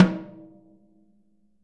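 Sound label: jazz snares; Sampleheads; Peter Erskine Living Drums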